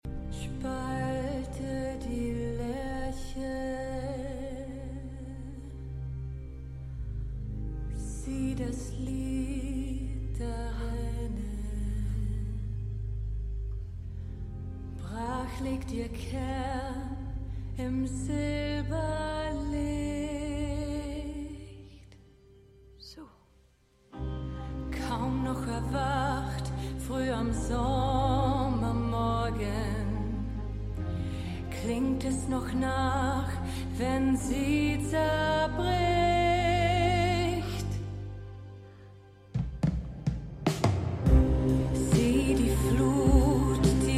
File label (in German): Gesang; Stimmproben